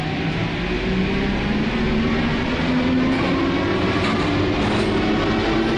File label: acceleration, Metro